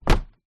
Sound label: household plastic recycle bin lid down